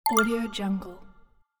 new achievement

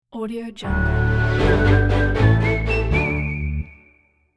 cheerful whistle sound logo

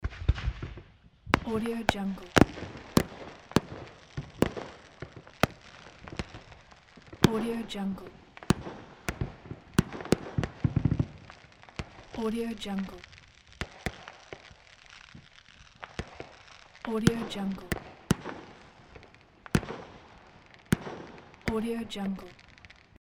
fireworks loop

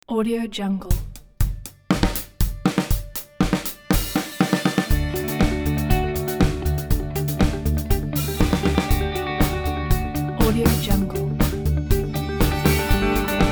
80s power rock; prettycoolmusic

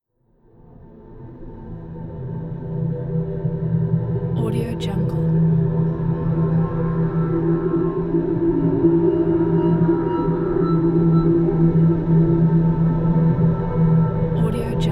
dark ambient background

